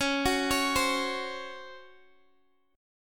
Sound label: Db Major 7th Suspended 4th Db down Guitar DADGAD